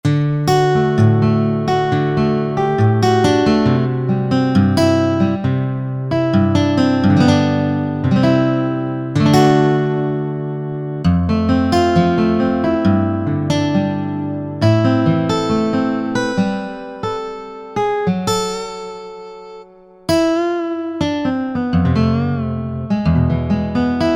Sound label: Steel Gt